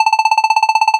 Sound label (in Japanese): 会話; nes retro talk sound effects